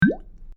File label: Plop